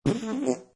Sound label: Cartoon Fart With Good Ending; Comedy/Cartoon; Starts big and loud, ends with a basic 'prrt'; Cartoon Sound Design; LynneMusic